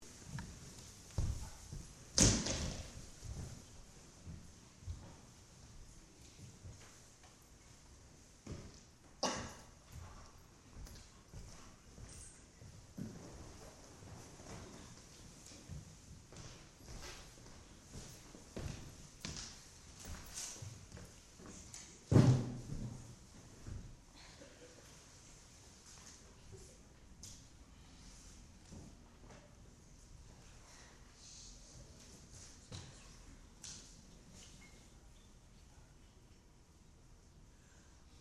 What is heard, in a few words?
Noise; Dome Theater